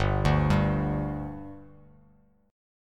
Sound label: G 5th G, chord; G5 arpeggiated